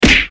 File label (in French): Coup de poing; Coups de poing